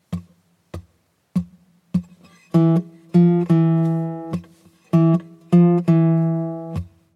Blues Guitar Turnaround

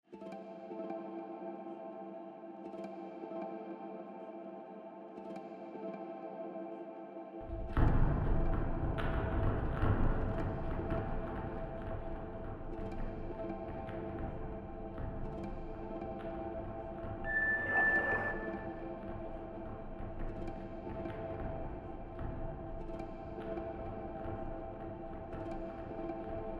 Forest

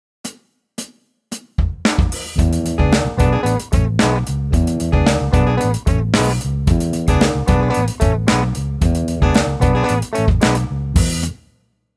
Chicago Blues Guitar; Chicago Blues Guitar Lesson

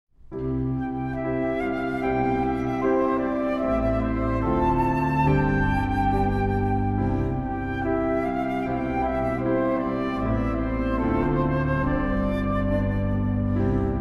psalm 93 andantino andante con moto finale

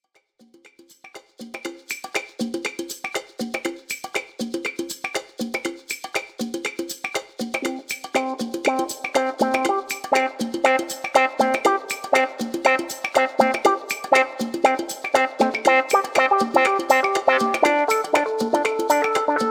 beat

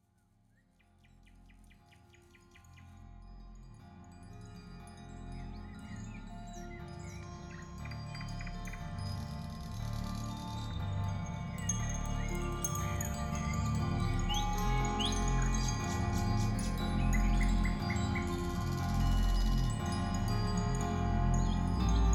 Chimes Birds and Bowls Medi musik; Demo Episode

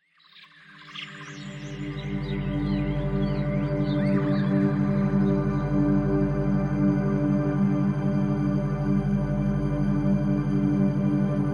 Alpha Waves

